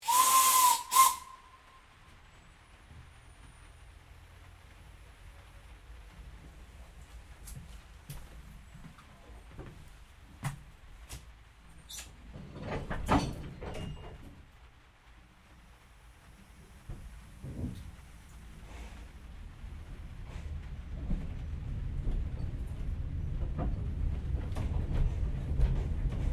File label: Train Steam Locamotive Kraus 0-2-0 Carriage Interior; 1906